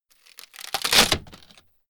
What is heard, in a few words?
wood splinter low